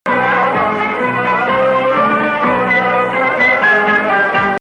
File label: Hijaz